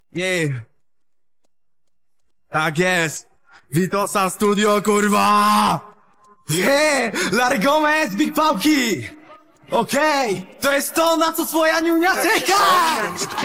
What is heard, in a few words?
vocals